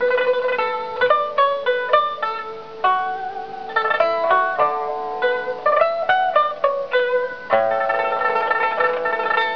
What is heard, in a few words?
pipa